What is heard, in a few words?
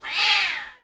cat threat